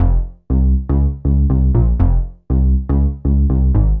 BASS, DANCE; MAGIX

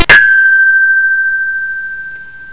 chime

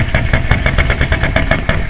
MACHINEZ

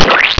SPLAT, FUNNY; EFFECTS

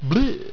bleach

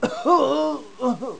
DIE

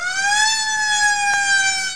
SIREN; BOXEM

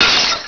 broken gl; sci-fi sensation

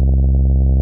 hummer; DWPsychoWard

